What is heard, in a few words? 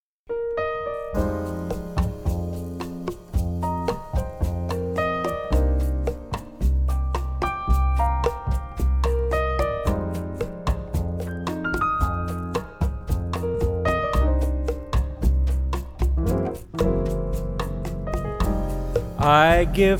Trio Quartet with Vocal